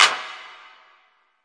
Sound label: big verby clap, drums single hits; Claps